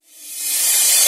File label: Cymbals Reverse; Vengeance Samples